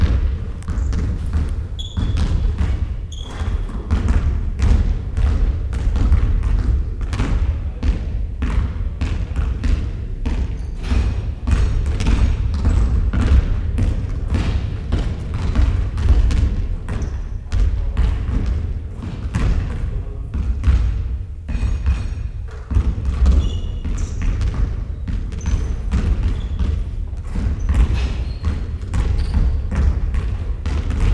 basketball training

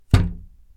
lower toilet lid; 古いトイレの蓋下げ

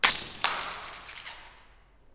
kick cell phone; horror

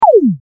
8bit laser; arms

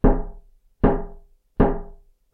knocked in; knocking

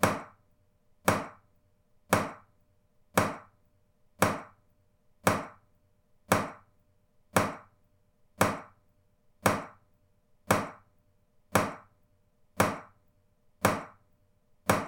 leaking shower, シャワー水漏れ; wetarea

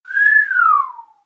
whistling; human

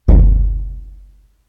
Japanese drum

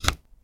plug in USB

